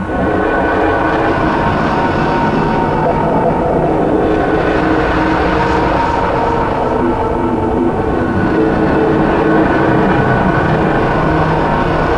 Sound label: wildspace ambience left